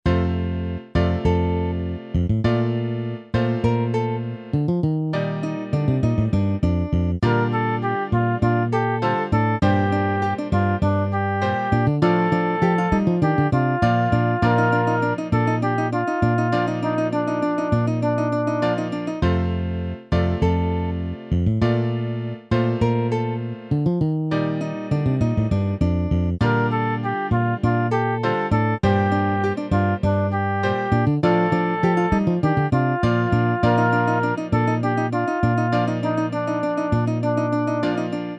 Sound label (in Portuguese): flauta doce um solo de baixo